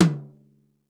RUDE TOM H; East West; Global Sounds

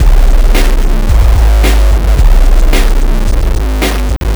Noise Beat; Drums & Drones

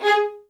MED ATT G# 5, soft violins; Q Up Arts; Denny Jaeger Master Violin Library

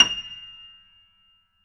PIANO FF F7

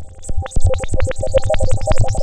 TENTACLES; Pro-Rec - Synthsonic

